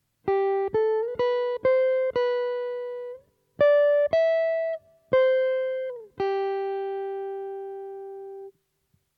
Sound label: chord melody guitar